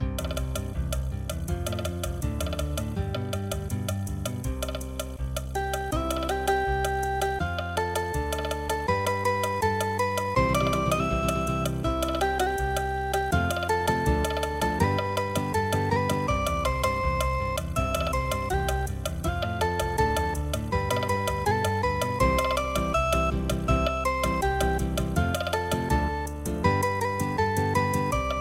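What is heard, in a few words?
flamenco, midi; little brown jug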